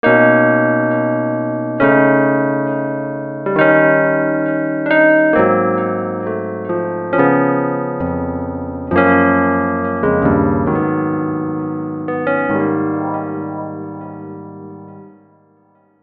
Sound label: epiano